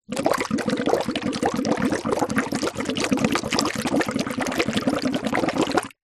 nature water bubbles small shallow water plastic straw container short; zapsplat